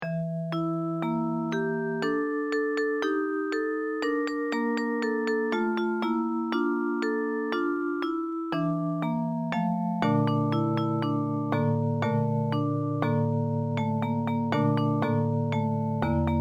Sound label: folk song of kyoto; ichijo modoribashi